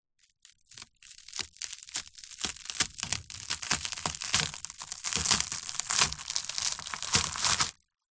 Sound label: food crispbread squash crush on wood